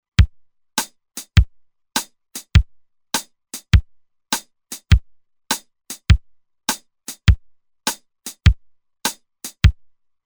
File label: Reggae, Roland TR-505; Factory Rhythms